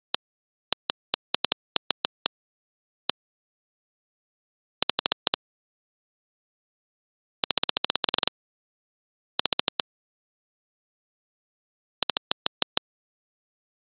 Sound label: clicks